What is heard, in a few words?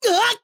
Damage